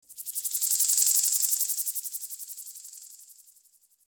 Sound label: voice insects; maoudamashii